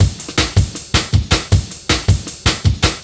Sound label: Breakdown Beat, Drum N Bass; Sonic Foundry (Sony Creative Software); Knutrix Drum & Bass